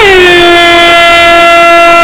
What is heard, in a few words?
air horn club sample